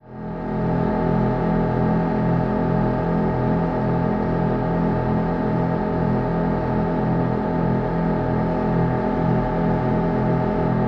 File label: Military Pbr: Onboard Fast, Steady Cruise. Slight Accelerate To Faster, Steady. Dual